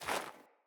Sandals Walk Snow; Player; Footsteps

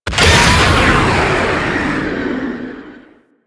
Corpus Powerplant Jettison Drop; Ambience; Warframe; Corpus Railjack; Tether Power Plant

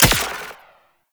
Turret Fire; Warframe; Liset; Gyro

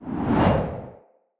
Long Sword Accent; Weapons; Mother

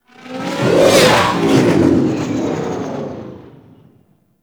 Fighter Ship Close Fly By; Warframe; RailJack; Corpus; Space Fighter Crp Ship Engine A

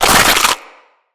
Infested Ancient Tank Slingshot Throw; Emissary Ancient Tank

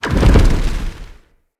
flock shot; Enemy; Abilities; Thawtide Hunter; Sinecure; Ranged Hunter Thawtide

